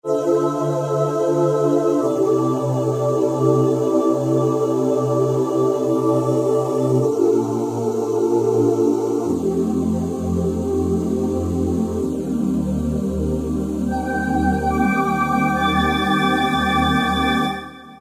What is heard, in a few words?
vox Ooh, E-mu PK-6